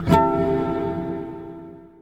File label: dialog error